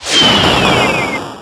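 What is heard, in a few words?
Battle Skill; Etrian Odyssey III HD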